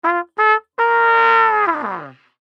silly trumpet

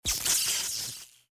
Sparkle

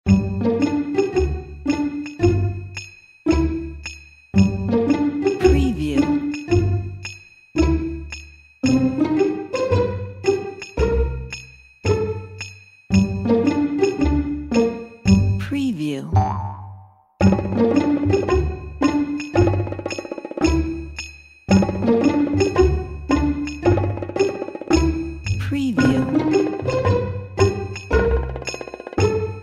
Funny Spy Detective Pizzicato Comedy Stock Music

